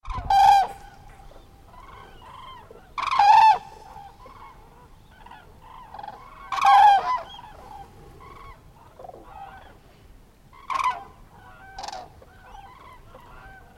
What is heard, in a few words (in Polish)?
zurki o 2 w nocy